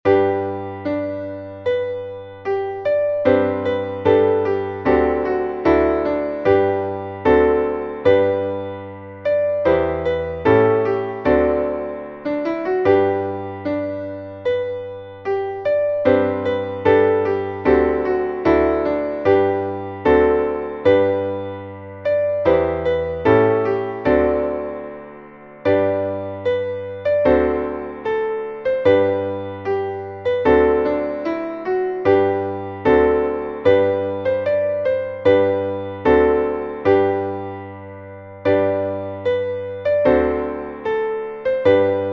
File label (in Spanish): marcha real